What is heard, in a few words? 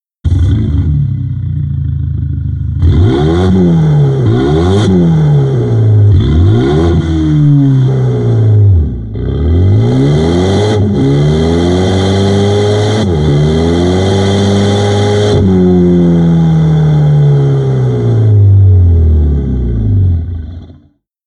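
Megane, Active Sound System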